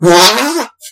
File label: Armpit Fart